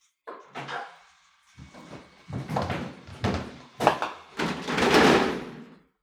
9-Pin Re-Rack, Bowling; SoundBits

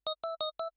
power up; Kenney's Sound Pack; Digital Audio

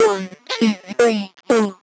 Vox, Circuitbend; 99Sounds; Mangling Audio

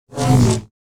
Saber; FXHome

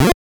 Retro Video Game FX; Glitchedtones

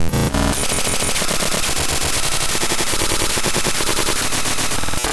Rhythm

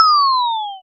lose; Kenney's Sound Pack; Retro Sounds 2

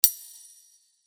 Sword Sound; FXHome; Fighting Sounds

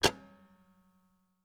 Old Metal Clock; 99Sounds; The Warehouse

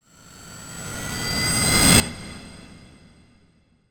Riser, Effective Trailer Risers; Federico Soler Fernandez

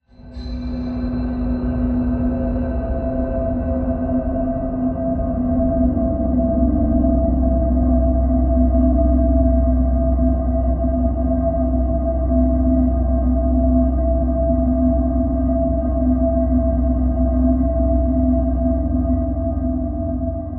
Drone (E), Trailer; Federico Soler Fernandez; Effective Trailer Drones

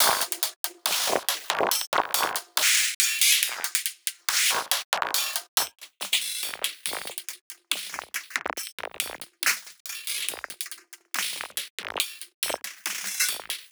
Loop, 70 bpm, Circuitbend; 99Sounds; Mangling Audio; Loops

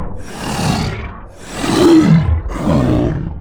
Rage Monster, Monster Zombie Vocal; Coll Anderson

